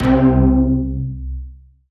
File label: SYN, DANCE SYNTH